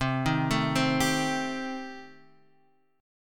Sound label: D sharp 6th Flat 5th, C